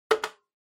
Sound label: Tabletop Timer Digital Button; タイマー機器